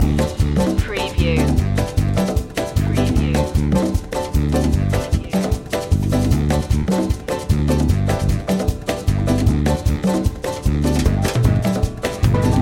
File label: merengue loop